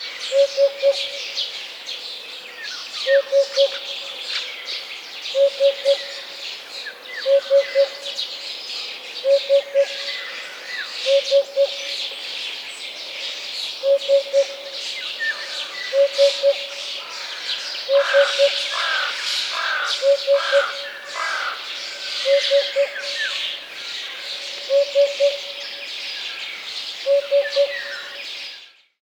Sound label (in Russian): udod